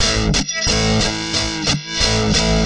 guitar chunka; GUITAR LOOPS

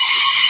subway sound; Subway Sound Construction Kit

